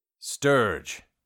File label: stirge; bestiary; 5etools